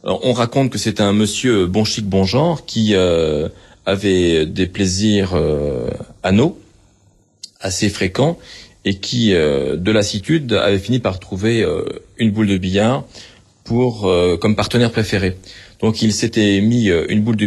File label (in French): violon